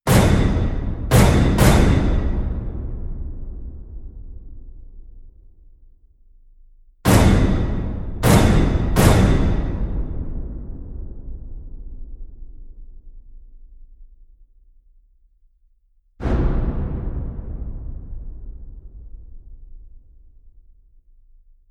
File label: big drum hits; blood money